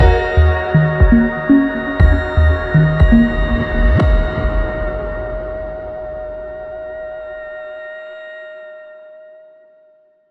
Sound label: Stinger